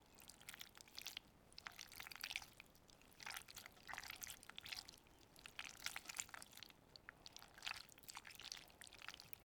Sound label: Wet Spaghetti Being Moved Around